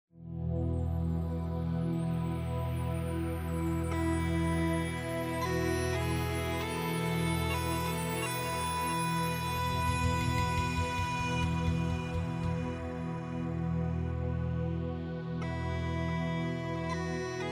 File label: Scene Highland